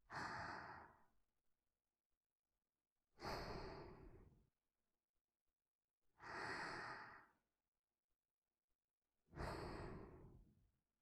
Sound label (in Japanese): 寝息トラック(L)